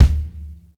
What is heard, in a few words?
Kick, Drum Hits